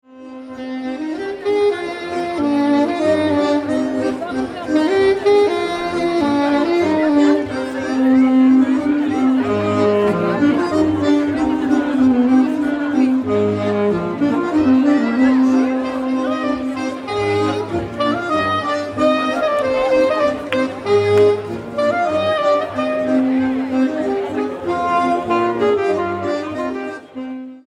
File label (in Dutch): folk